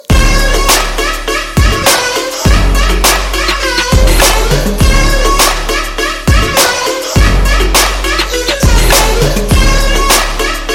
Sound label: Ringtone